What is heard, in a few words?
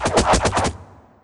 Human Hunter Fall